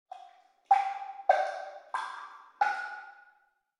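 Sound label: Room Shower Faucet Drip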